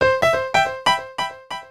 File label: piano